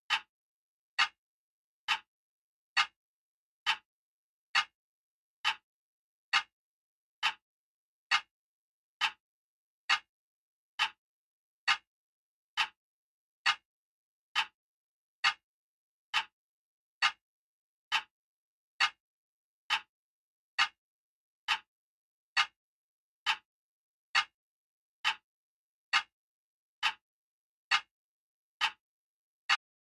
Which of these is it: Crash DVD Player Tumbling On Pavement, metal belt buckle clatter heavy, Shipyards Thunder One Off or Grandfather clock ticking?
Grandfather clock ticking